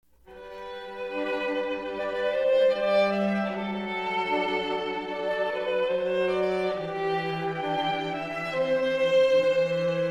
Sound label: Quartet of violin, viola cello and flute; J.S. Bach, Arioso